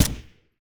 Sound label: ssg552 silencer; STALZONE